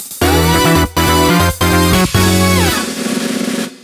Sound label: Final Lap Fanfare; Mario Kart 7; (Race and Time Trial) - 30 Seconds Left (Battle)